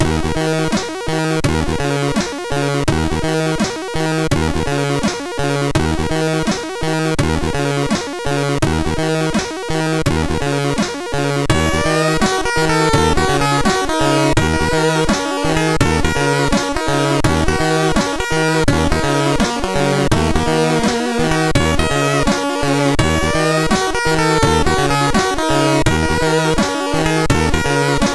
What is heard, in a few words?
Industrial, MOS6581R4; hvsc; Digger